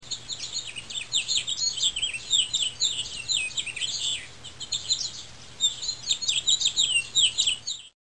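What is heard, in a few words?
house finch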